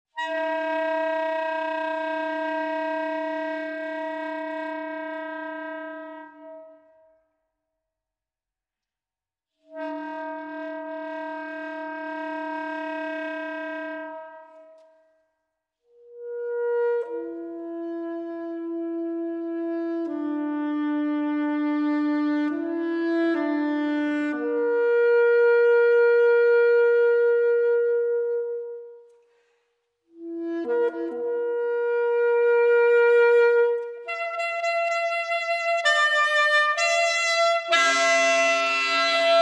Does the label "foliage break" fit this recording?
No